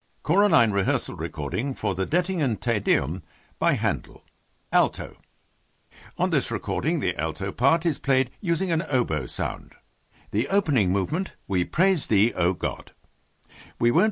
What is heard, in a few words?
Alto; Handel; Dett Te Deum